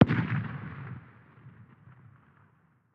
very far distance, distant 30-06; tacz-tweak-pack; taczmechanics

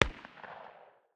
pistol 45 far distance, distant 45 acp; tacz-tweak-pack; taczmechanics

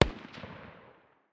ar far distance, 5.56x45mm; tacz-tweak-pack; taczmechanics

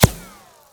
dirt, hit; tacz-tweak-pack; taczmechanics

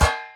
metal, hit; tacz-tweak-pack; taczmechanics

